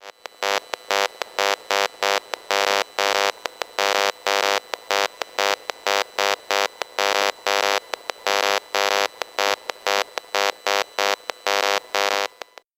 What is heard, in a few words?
DECT Baby Monitor